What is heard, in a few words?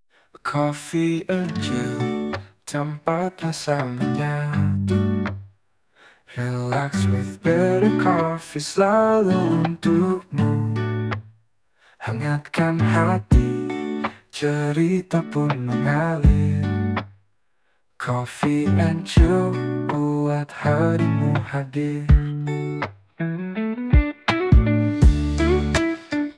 jingle coffe chill